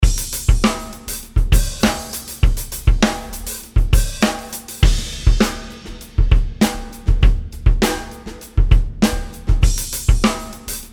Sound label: Rock Groove, Drum